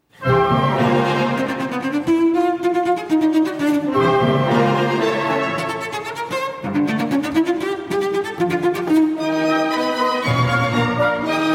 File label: Cello Concerto, Allegro, Live; No. 1; H. 196